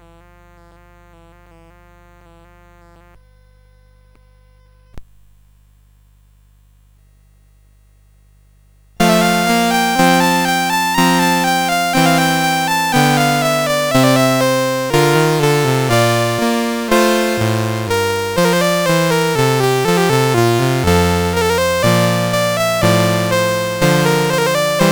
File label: CSG8580; CSG8580R5